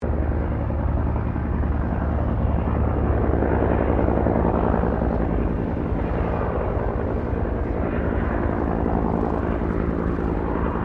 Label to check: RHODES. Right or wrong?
Wrong